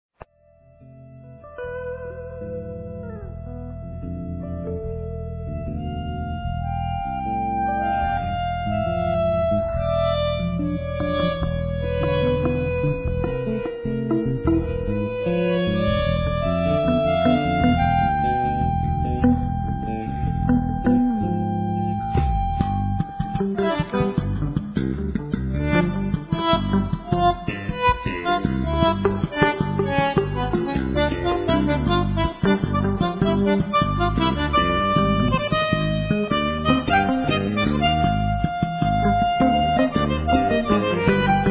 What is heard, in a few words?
Jazz meets Tango